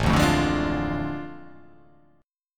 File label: A Major 7th Suspended 4th Sharp 5th